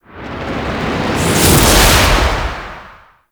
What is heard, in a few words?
Void Comet Whiz By; Void Storm; Railjack